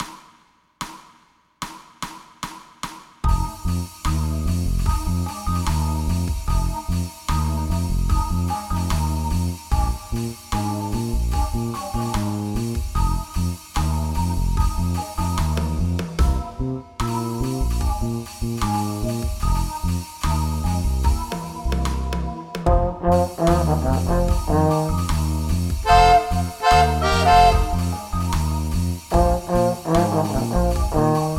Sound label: Blues